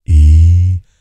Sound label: Vocal Planet R&B Dance BASS CATCH